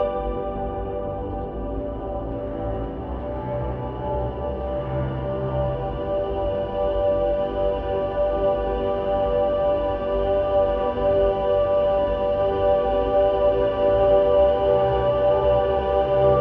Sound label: Lazily Loop Drums 85bpm; jenny leclue detectiv